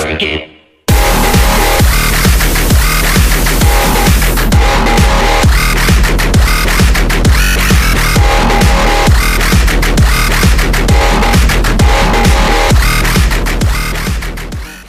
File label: electro; roundsound